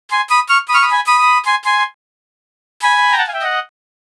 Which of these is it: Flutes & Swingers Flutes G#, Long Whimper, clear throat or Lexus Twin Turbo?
Flutes & Swingers Flutes G#